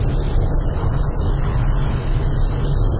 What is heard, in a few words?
portal ambi; deathrun taringacs portal